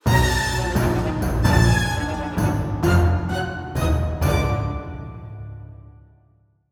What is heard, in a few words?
Stinger; Huntsman Altimor